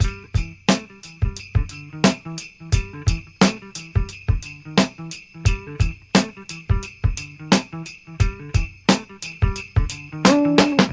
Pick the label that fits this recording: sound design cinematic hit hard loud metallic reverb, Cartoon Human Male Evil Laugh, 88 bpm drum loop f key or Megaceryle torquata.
88 bpm drum loop f key